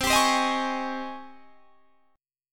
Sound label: G sharp Major 11th C